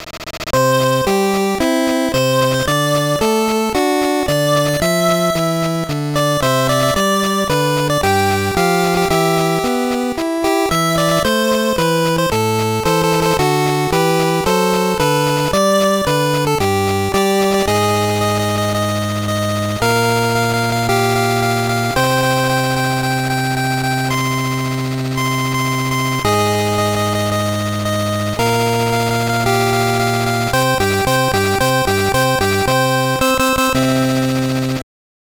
BGM; Super Momotarou Dentetsu II; GB

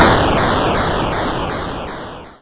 Flash

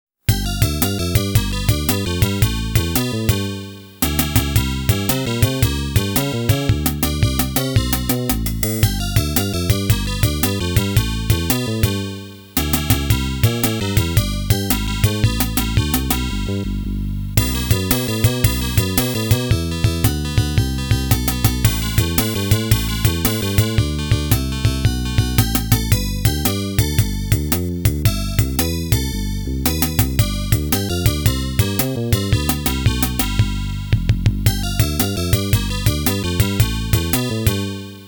Room BGM, FM Sound; Monster World Complete Collection; Monster World II

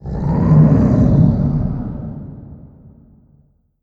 Cave Monster

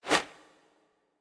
Chapter Completed Text Bar Apear

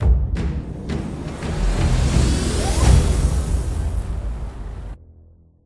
Transition End; Everspace 2

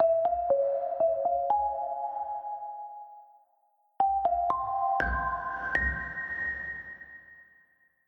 marimba; Toki Tori Collection

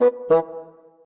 Minimize; daVinci

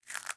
Cookie; miside demo windows gamerip 2023

